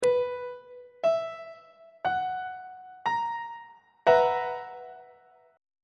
a double sharp major 7th suspended 4th chord on treble clef